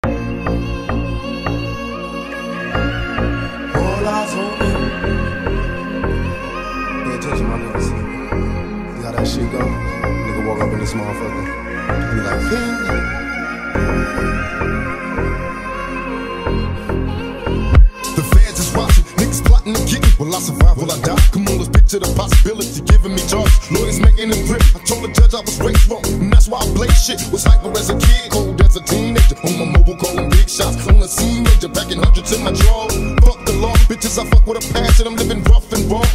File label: gangsta remix